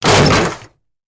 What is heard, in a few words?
Car Crash Elements Sideswipe